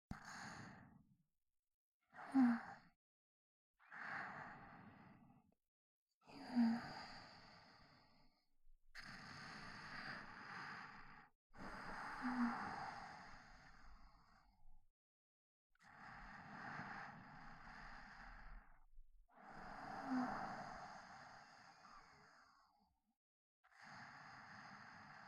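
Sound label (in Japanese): 寝息ループ（バイノーラルマイク）, ASMR; 効果音あり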